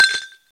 Glass, Yamaha TG-33; Drum Samples

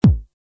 kick

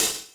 CYMBAL, Yamaha RM 50

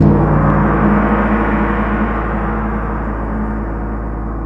Gong, Roland S50 Cymbals; Drum Samples

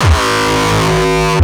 kick; shock. Ultimate Kicks vol. 2

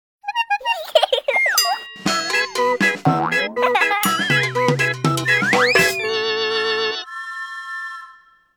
Kids ringtone; Nokia Ringtones; Audio themes

